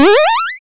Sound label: powerup; gemsters